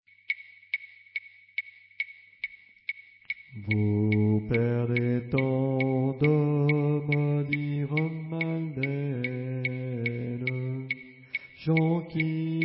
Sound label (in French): alto